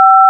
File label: Dtmf 5